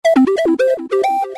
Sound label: Samsung Notification